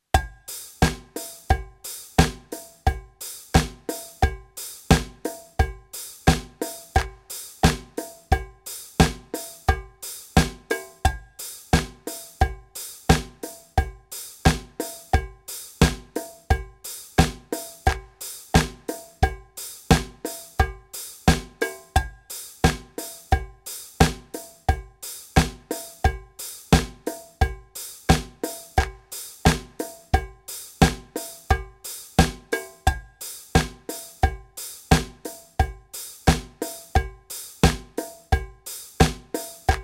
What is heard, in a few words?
Step Warm up; Level A